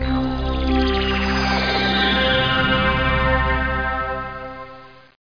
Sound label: jingle